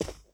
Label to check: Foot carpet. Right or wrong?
Right